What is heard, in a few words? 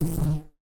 insect fly buzz around close up